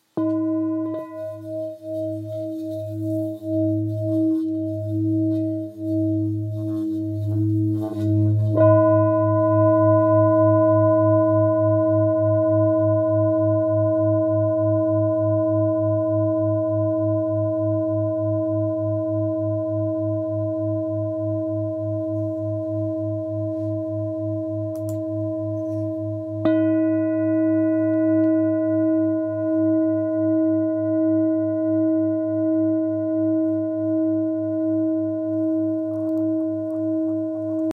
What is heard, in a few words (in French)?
Bengale sol diese 1.7-1.8kg, bol chantant tibetain; note sol diese; chakra gorge